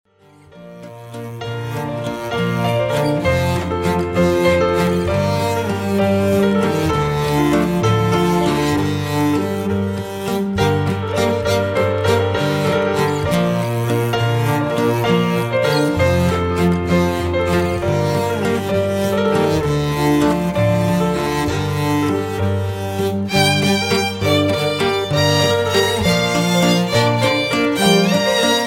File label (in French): valse